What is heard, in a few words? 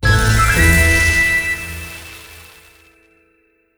medium Win Musical; netent